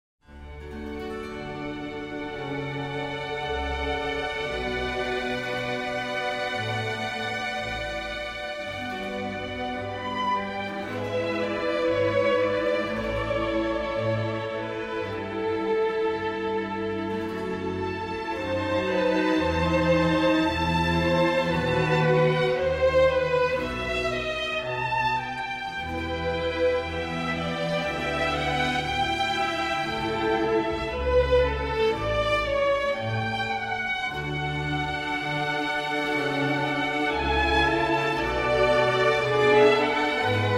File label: Orchestral Suite No. 3 In D Major; BWV 1068